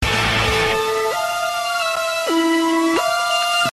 alert

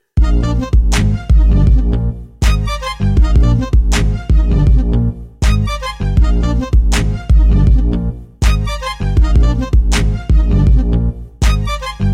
Accordian Bounce

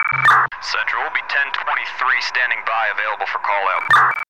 american police

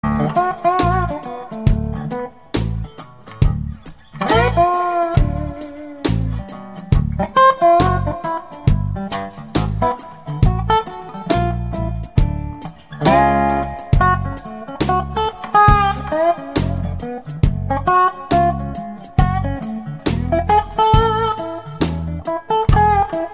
Slide

